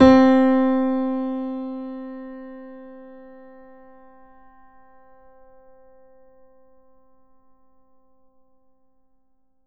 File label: Grand Piano C3 f; Piano & Keys